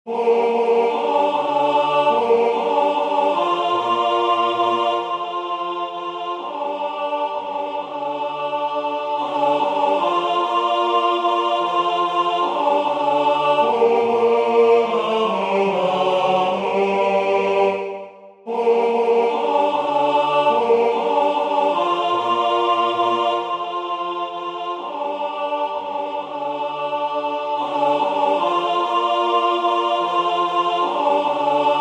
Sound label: tenor; Jan Josef Bozan; Nitida Stella